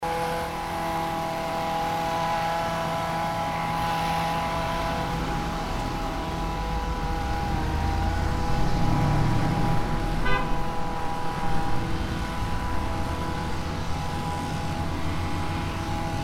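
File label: route 4 bush trimming, Fukushima Soundscape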